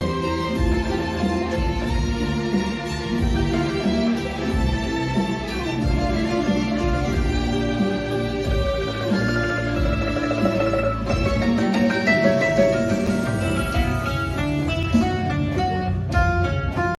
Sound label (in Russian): цимбалы музыка dulcimer; классическаямузыка